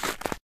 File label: snow step; Golden Crayon Sounds